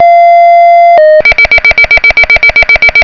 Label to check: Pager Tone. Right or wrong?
Right